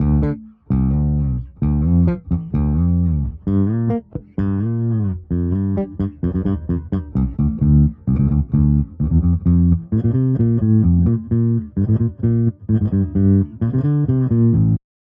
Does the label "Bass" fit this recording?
Yes